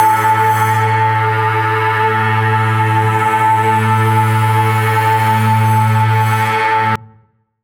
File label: Atmos, Strings & Pads; Zero-G Sound Sense Grime Scene; 90 s Sample CDs